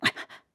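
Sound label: Hurt, voice; Link; BOTW; Breath of the Wild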